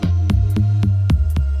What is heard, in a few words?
Bass Triplets; BB 2HTC Samples Vol 4; Solos